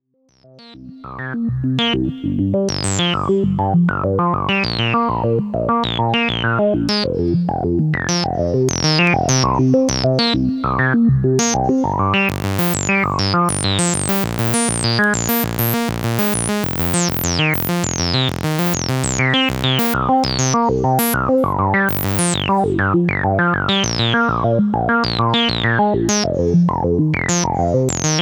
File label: prodigy filter